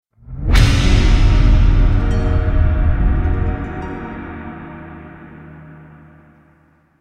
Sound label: trailer hit magic fantasy; the prometheus secret noohra